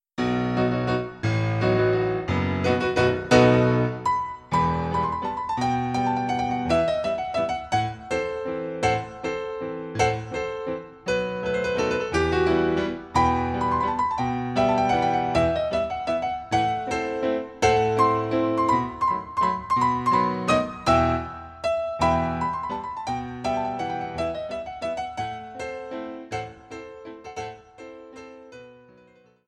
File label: Grand Allegro, Trad. Spanish; El Jaleo de Xeres